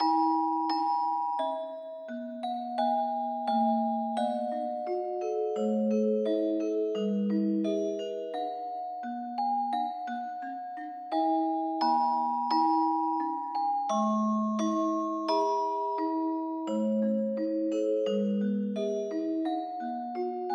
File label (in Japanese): 美保野小学校校歌（オルゴール風）